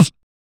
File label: Snare Sounds